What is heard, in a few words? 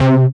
SYN, dance; Sound & Vision - Gigapack II CD 1